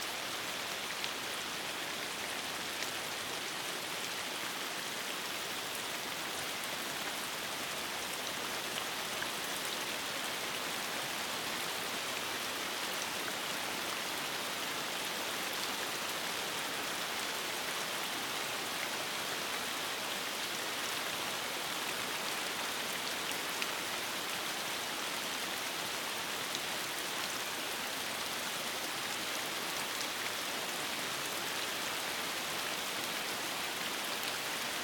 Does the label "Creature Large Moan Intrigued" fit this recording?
No